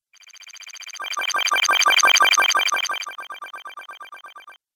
Retro Videogame Fx Strange Motion Bleeps

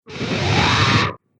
Scream Total Distortion; Screaming Sound Effects

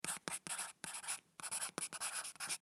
Office Pencil Write On Paper; Writing; Human

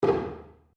Wood On Wood Floor; Material components for constructing more complex sounds; Sound Effects:Materials

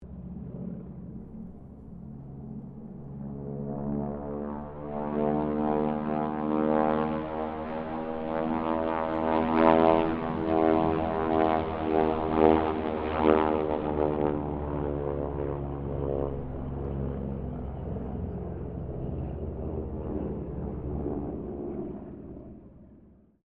Harvard squadron 4 pass, stereo

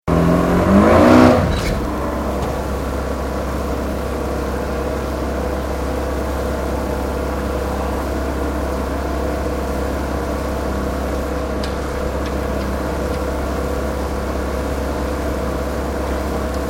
Boat Small Rev Idle Shutdown; Various private boats; Boats (Sound Effects:Vehicles)

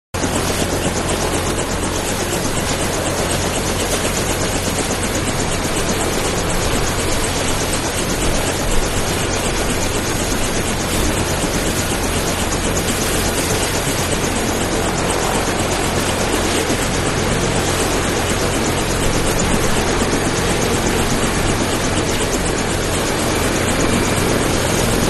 Helicopter Military, Idle And Launch; Military-2; Helicopters; Manhattan Production Music

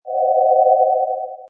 Sci-fi, retro sound. Scanning or robot movement; Lynne Music

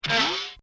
Comedy Effect Spring; Funny Sounds; Bobby Cole

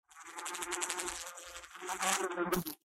Insect Bee Buzz Fly, Insect Bee Buzz Fly Die; Animal; Insect Sound Effects

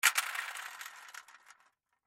Curtain Rod Drop Aluminum; Curtain Rod Drop Slide Aluminum; Windows; Foley; Household; Blastwave

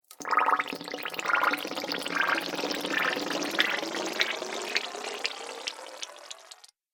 Water Bubbling Echoes, cartoon

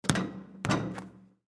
Basketball Shot Hit Rim Bounce Swish Net; SPORTS; Basketball (Sound Effects:Sports)

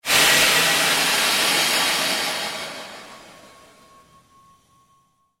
Created Pulse Fade, Rise And Dive Sound Effects

